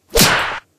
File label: punch